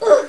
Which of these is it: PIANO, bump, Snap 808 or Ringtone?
bump